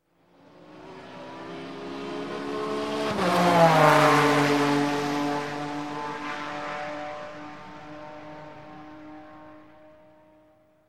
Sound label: Race Car Straightaway; Sound Ideas; Series 2000